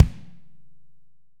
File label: AMB KICK; ST AMB KICK